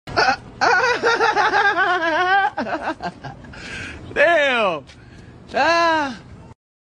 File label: Ahahah damn laugh; Meme